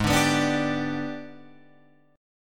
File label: Ab Minor Major 7th Flat 5th, down, Guitar, Open F; AbmM7b5; strummed